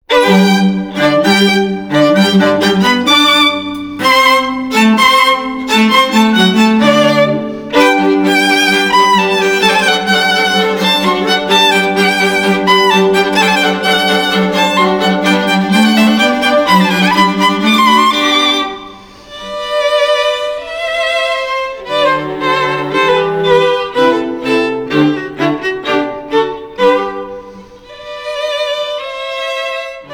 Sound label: Classical, Classical String Quartet